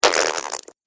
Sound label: Cartoon Slime Splat; Slimy Sound Effects